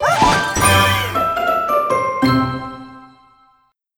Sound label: Crunchy Finish; Cookie Run Puzzle World